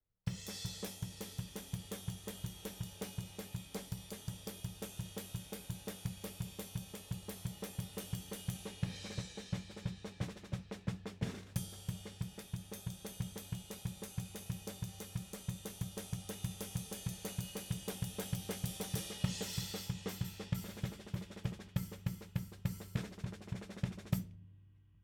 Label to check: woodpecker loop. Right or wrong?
Wrong